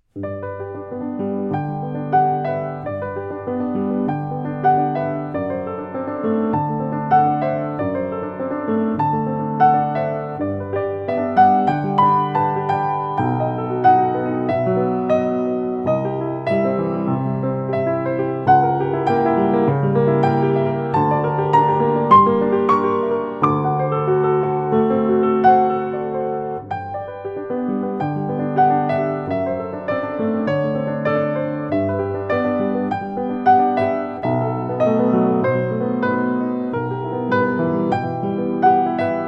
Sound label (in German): Klavierstück in G Dur Allegro molto